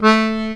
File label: ACCORD A1, Accordions; USB Soundscan vol.40; AKAI